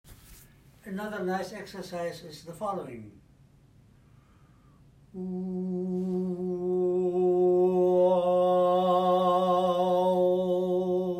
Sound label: Exercise Vowels, warmup